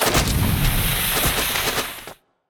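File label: dead book death, monster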